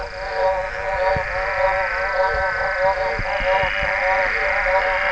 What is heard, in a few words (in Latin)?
Pseudacris; voice